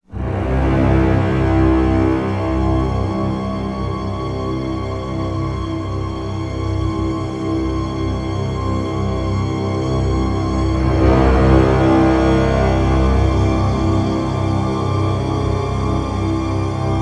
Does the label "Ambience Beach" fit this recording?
No